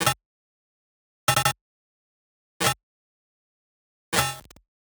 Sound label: Arcade Click Positive